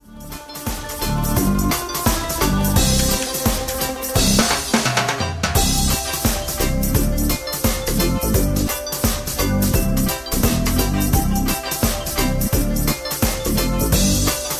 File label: karaoke